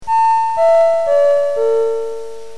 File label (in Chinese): 钟声